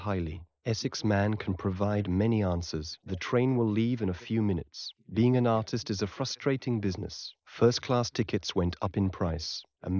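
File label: male, instantaneous mixture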